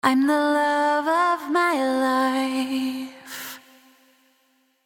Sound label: Vocals Vocal